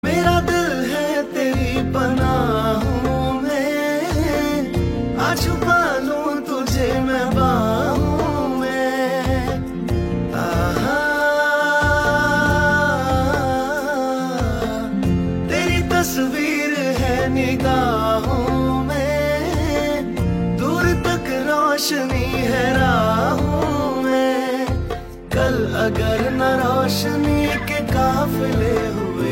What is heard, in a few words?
Darbuka Version